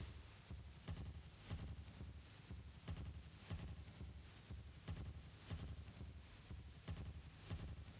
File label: 120 Beats, Drum Loops; Touch Loops; BlissedOutBeats